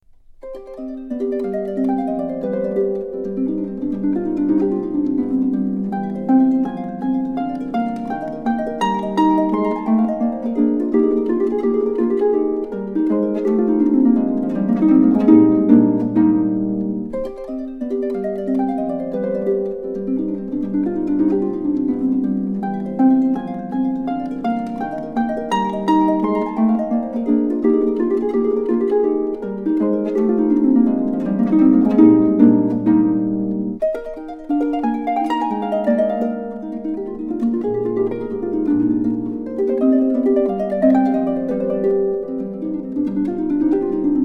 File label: Sonata C Minor; Pescetti; part III